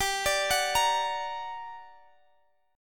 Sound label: G Minor Major 7th G, Guitar, Half Step Down